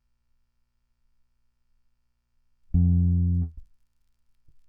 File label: bass